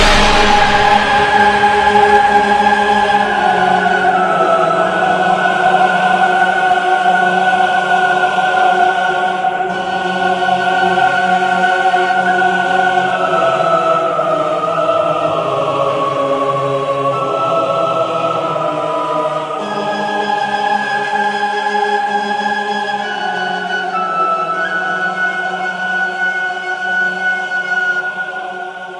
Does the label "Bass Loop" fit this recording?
No